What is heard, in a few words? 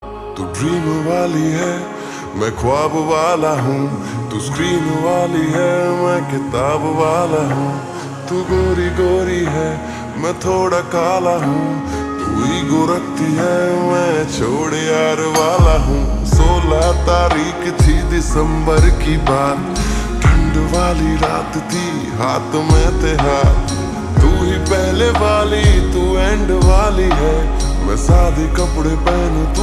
Ringtone Slowed Reverb